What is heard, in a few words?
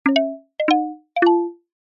cartoon footsteps sneaky tip toes wooden mallets; zapsplat